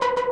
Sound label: synth; osu